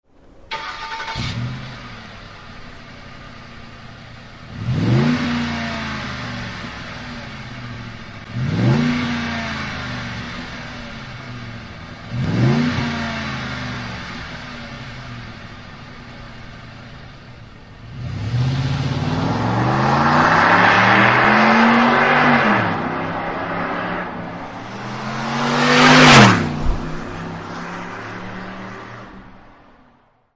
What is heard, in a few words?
magnaflow exhaust systems